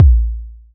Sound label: deep steel kick drum sound a sharp key; Duration 0:01; Channels Mono; Bitdepth 24